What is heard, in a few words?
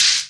natural open hat one shot c key